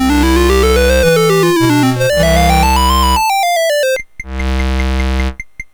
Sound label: Tuning up the Orchestra, MOS6581; MOS6581R3